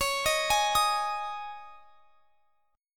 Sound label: C sharp Suspended 2nd, C sharp; C#sus2; arpeggiated; x,x,23,20,21,23